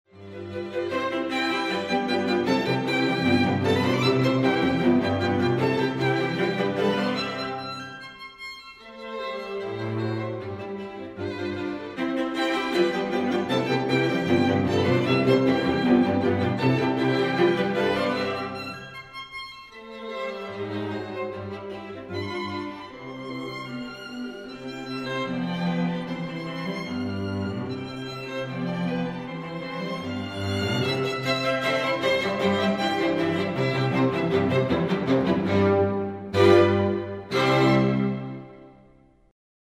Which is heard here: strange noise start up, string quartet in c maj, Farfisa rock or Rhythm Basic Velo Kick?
string quartet in c maj